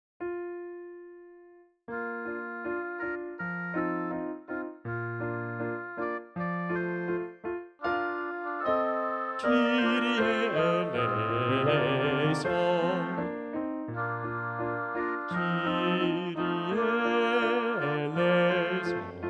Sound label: kyrie bass